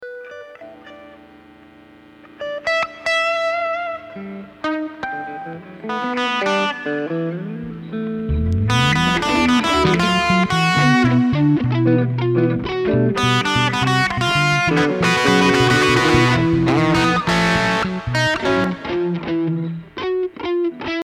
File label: Drum Samples Mix